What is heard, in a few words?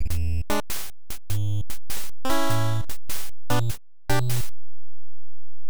Scenary Scrolling; Vs. Hogan's Alley; arcade